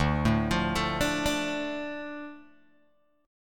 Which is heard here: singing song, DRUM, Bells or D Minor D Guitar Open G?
D Minor D Guitar Open G